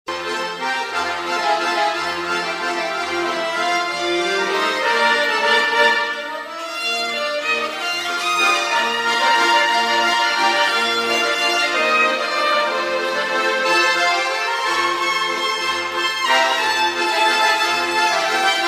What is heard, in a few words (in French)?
Valse; Krakow